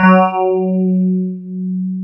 DS8 F#3, Korg; Back In Time Records; Korg Universe VOL-1

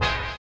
STREET; Spectrasonics; Metamorphosis

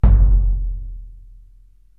BD Orchestral, Korg TR-Rack

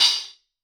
Soft Crystal, Hats; Airwindows

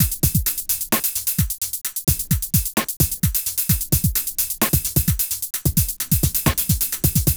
Beat; Sonic Foundry (Sony Creative Software); Infinite Soul VOL-2; Beats

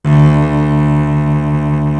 solo cello; East West; Global Sounds

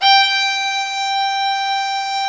STR VIOLA, Str Quartet, Strings

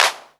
clap, hip; Best Service; Dance Mega Drums

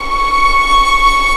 ANG.V C#5, ANGELIC

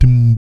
PERC, Vocal, Jazz FX, BASS PERC

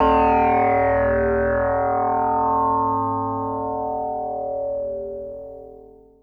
Synth hits and riffs

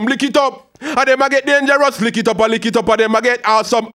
HAL RAGGAM, RAGGA-MUFF A maj; Sound & Vision - Gigapack II

